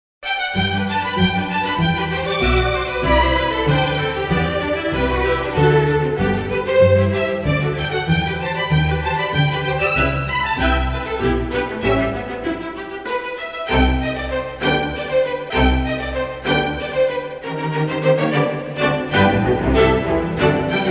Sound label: classical